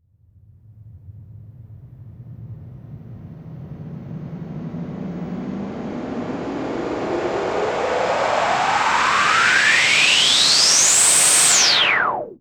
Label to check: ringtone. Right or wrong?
Wrong